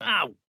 AHurt

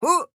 Jump

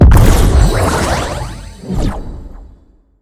Shield and Bubbles

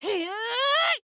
Charge

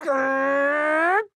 Charge; Fern

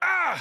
AHurt

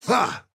Attack Small

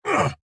AHurt

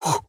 Superman Punch

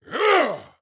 Attack Large

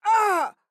AHurt

